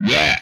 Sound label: hit, vocal; MMRetroArcadeSoundsPack